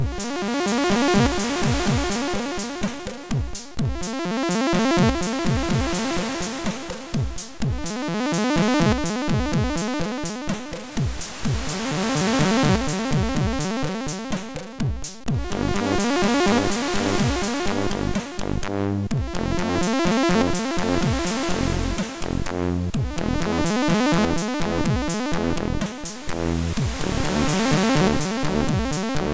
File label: sid MOS6581; MOS6581R3